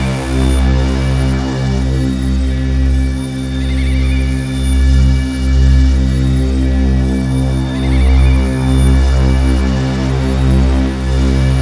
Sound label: CYBERDRONE; Spectrasonic Distorted Reality 1